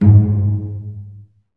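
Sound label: STRINGS SECTION 5; Roland - LA Composer vol. 1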